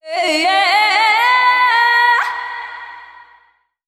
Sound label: ayeah sung, voice; ooh ahh